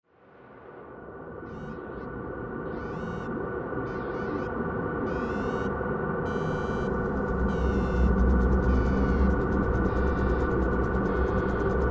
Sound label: helicopter